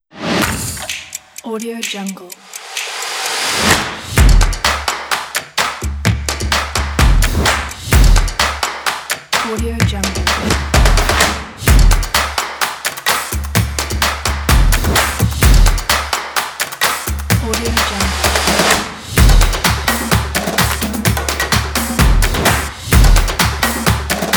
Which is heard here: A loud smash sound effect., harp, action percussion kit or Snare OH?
action percussion kit